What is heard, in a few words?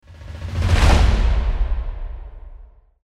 Epic Hit; افکت صدای ضربه سینمایی